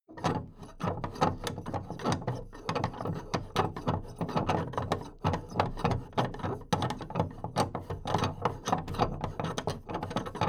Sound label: big plastic case movement handle fast